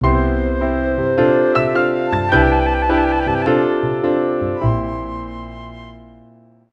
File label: Bari Sax